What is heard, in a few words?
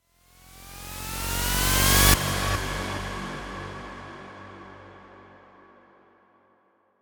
boss1 transition sound